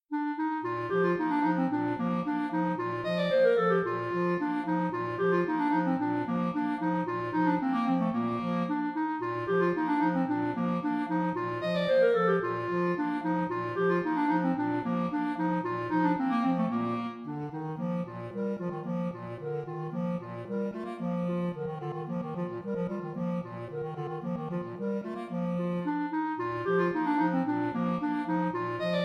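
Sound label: gavotte in C major clarinet bass clarinet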